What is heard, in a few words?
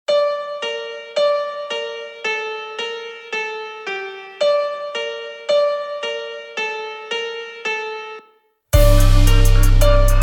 instrumental